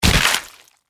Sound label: Impact Body Fall, Horror, Impact, Body, Fall, Splat; Blood and Guts